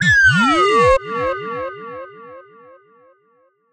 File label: FX Throat Sine